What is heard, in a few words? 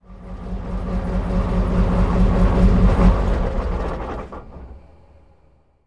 chieftain engine stop, tanks; War Thunder